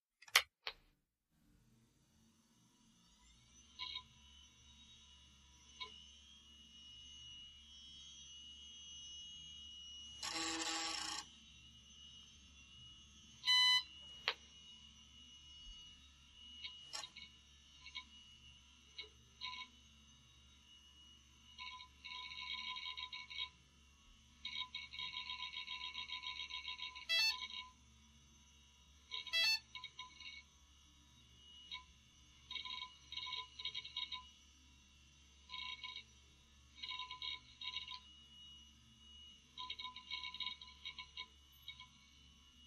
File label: Pc Laptop Boot Up